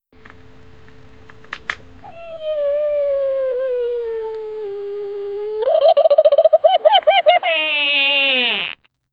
a small peephole opens, door

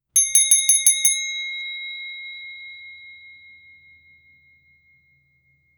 double bell ding notifica

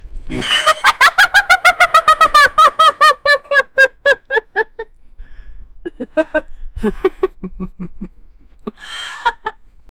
santa claus laugh ho ho; holiday

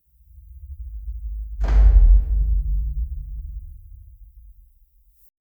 light measured footfalls, ceremony

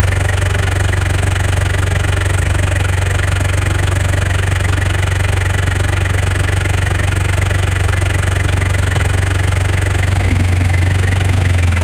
v8 engine sound; automotive

